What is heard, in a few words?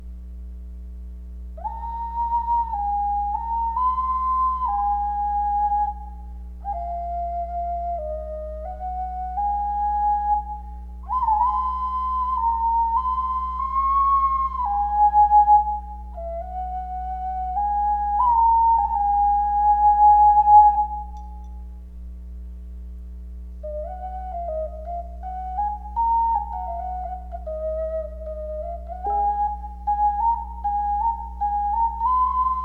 Ocarina